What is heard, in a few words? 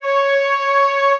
flute